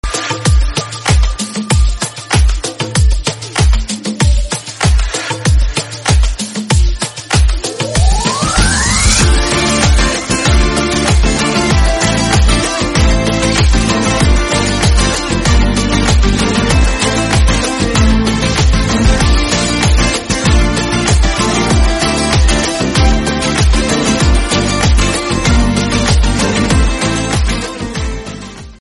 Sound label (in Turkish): enstruementala turk zil